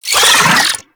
Wall Climb Movement Vocal; Warframe; Oraxia

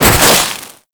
Heat Gun Hit; Warframe; Grineer

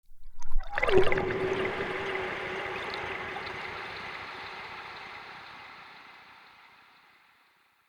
trippy lap, ambience; Soul Plane

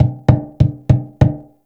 Finger Thump; Railjack Hunt

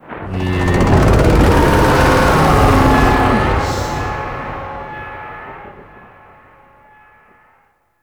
Void Dragon Vocal Distant Idle; Duviri; Warframe; Citrine's Last Wish

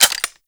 Double Barrel Shotgun Clip In; Warframe

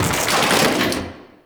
Chair Destroy Misc; Ambience; Gameplay; Warframe; Entrati

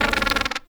Bird Three Chuff; Warframe; Entrati

